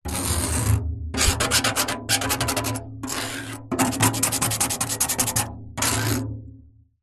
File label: metal spike small scratch rusty metal trailer panel various; foley; zapsplat